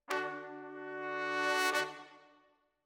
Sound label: Brass Over